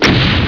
Treffer; kampf

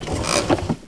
Schrank

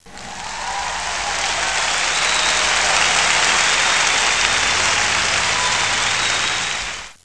applaus; mgxshow

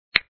schalter sound; selfstorage

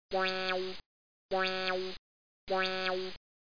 magnetschild; cannonhills

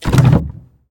klavdeck auf; dach

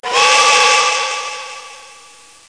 Tuuut

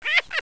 möve; Loewe1

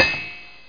kling; happyday

